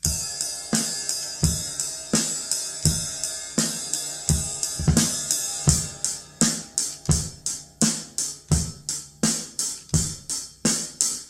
drum sample

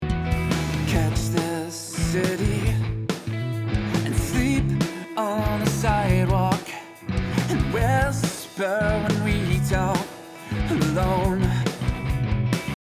processed vox in the mix

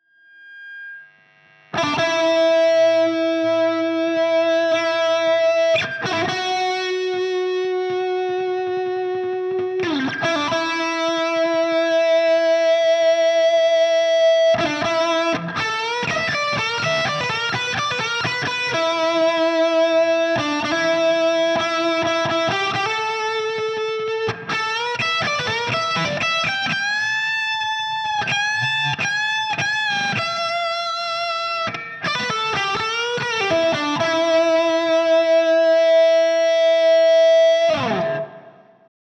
solo

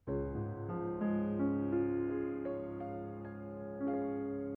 piano

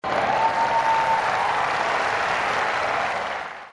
big applause

